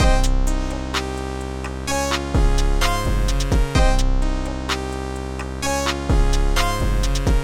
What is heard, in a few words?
Full Beat Hip Hop